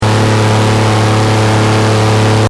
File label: Engine: Medium Revs Loop, car; Vehicles; Car Sound Effects; Cars (Sound Effects:Vehicles); Stuart Duffield